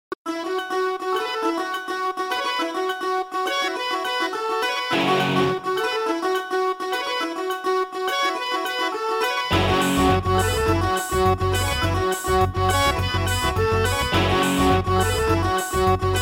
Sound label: bollywood hiphop; ringtone